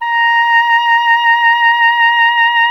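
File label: Wind OBOE A; Miroslav Vitous - Solo Instruments VOL-2; Solo lnst. II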